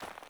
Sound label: Sandals Run Snow, Footsteps; Player